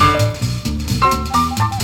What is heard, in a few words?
piano, Loops; Phonky